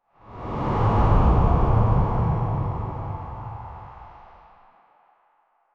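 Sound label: a whoosh sound with digital, synthetic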